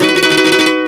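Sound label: OCT GUIT MEL, OCT GUIT MELODY; Best Service; World Colours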